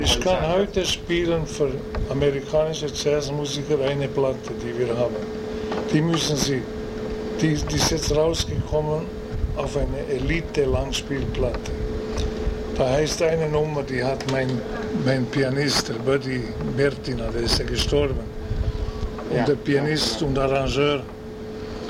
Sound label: Stomp